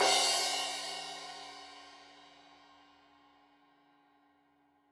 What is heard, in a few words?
Crash 15in L edge, Cymbals Stereo